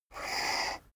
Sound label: poker chip slide table short